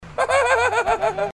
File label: hahaha